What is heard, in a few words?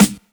SD, HIP, Dance Mega Drums; 90 s Sample CDs; Best Service